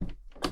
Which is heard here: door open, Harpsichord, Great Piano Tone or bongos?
door open